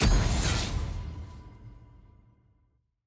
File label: ui game invite accept click; parties